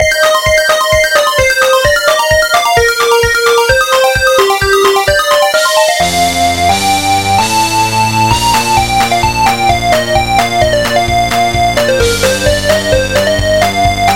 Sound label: Techno Remix, MIDI